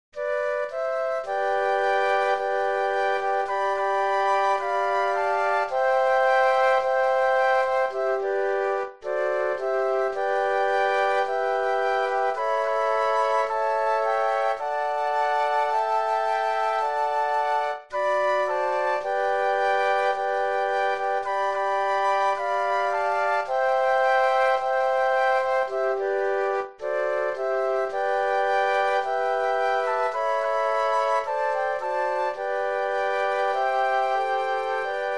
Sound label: Flute Quartet